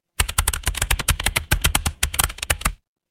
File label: office keyboard